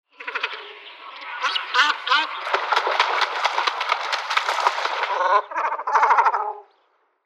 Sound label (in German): Kormoran Phalacrocorax carbo; Vogelstimmen